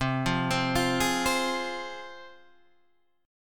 C Suspended 4th Sharp 5th, C, down, Guitar, Half Step Down